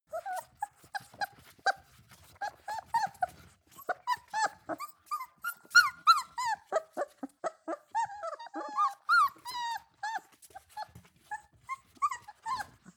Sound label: puppys whine long; natur-tiere; hunde